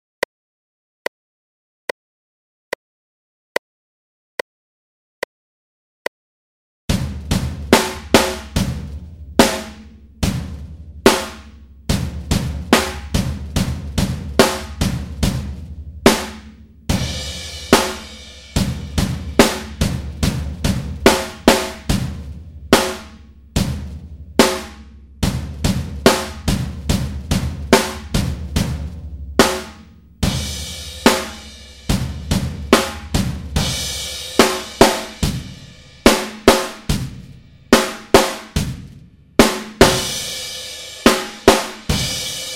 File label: drums slow